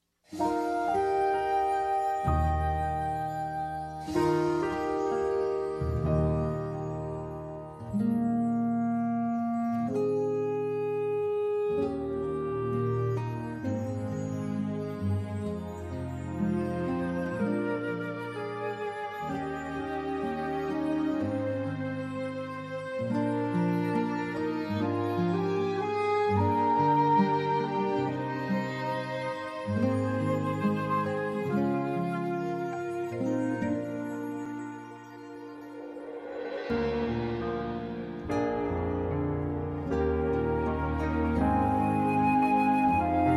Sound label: ACCOMP Eb